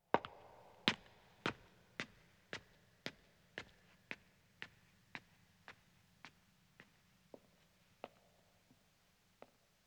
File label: Stone Stairs (Echo) Ascending, Footsteps; BBC Sound Effects Library; Footsteps II